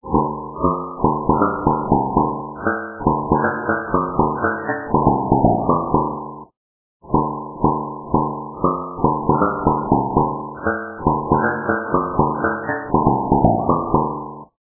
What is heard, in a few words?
plates